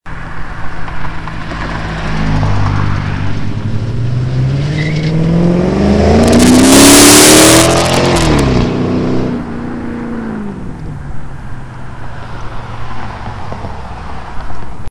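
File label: Magnum Drive By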